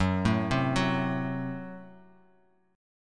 F sharp Diminished Bass Standard; F#dim arpeggiated

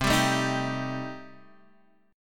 C Minor 6th, chord

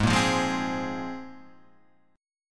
Bb 7th Suspended 2nd Ab

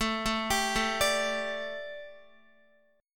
A 7th Suspended 4th Guitar, chord; A7sus4 arpeggiated

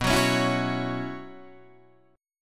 B Suspended 2nd Suspended 4th, Guitar, Open D

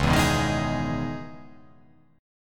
C sharp Minor 9th C sharp, chord

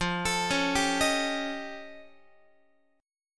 F Augmented 9th F Guitar; F+9 arpeggiated; x,13,12,11,11,14; JGuitar

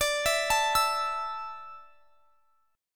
D Suspended 2nd D down, chord; Dsus2; arpeggiated

